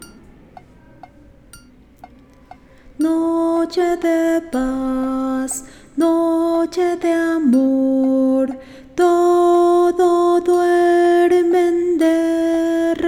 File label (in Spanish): Alto Voz; Noche de Paz